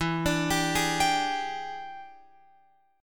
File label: E 7th Sharp 9th Guitar Open F, chord; E7#9; arpeggiated; x,11,14,14,11,14